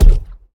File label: Ball Player Hand Hit Extra Hard